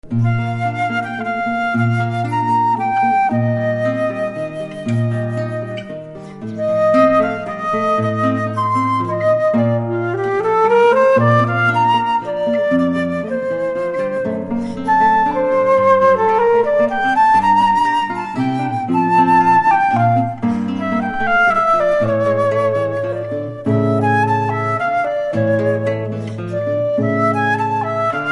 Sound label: Siciliano